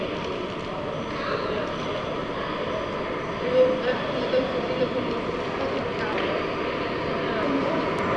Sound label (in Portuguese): estacao do metro sao bento